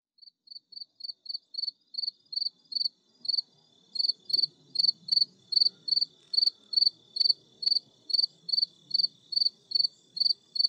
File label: bird; Zomboid 41.78.16; Project Zomboid